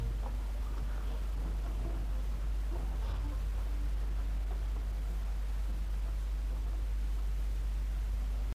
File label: silence